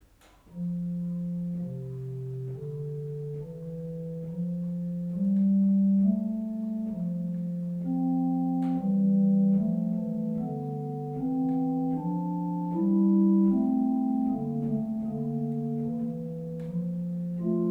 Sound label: organ; profire